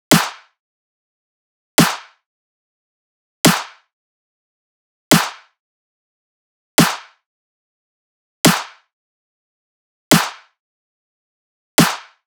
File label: Drum Loop, 144 BPM Snare, Future Bass; Drum Loops; Cymatics; GHOST (Beta Pack); Brisket Drum Loop